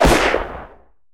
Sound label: explosion smoke; cannons; War Thunder